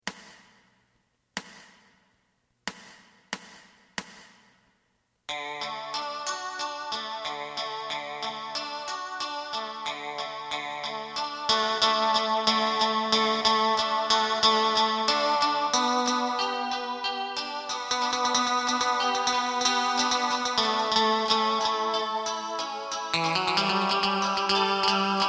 bass; エレキバンド用カラオケ

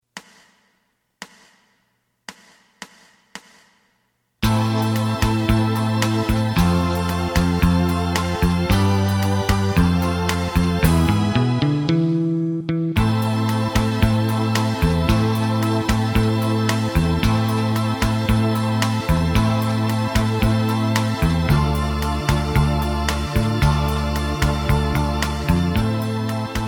lead; エレキバンド用カラオケ

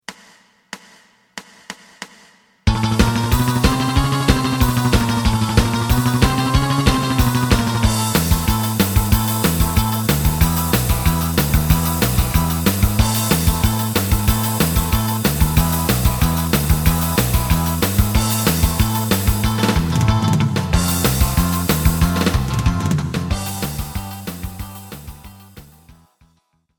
lead; エレキバンド用カラオケCD

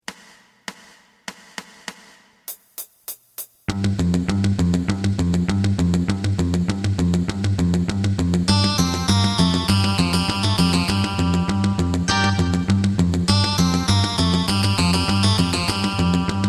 drum

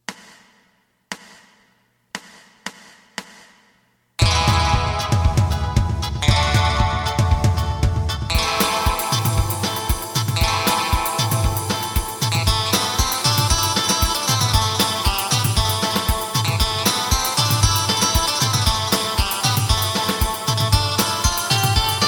bass

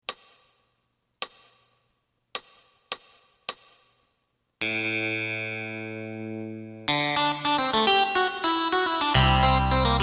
drum; エレキバンド用カラオケCD

